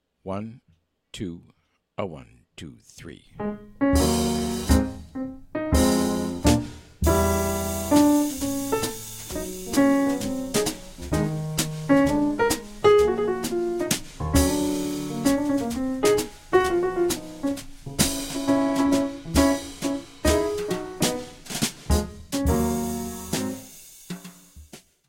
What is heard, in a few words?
minus Bass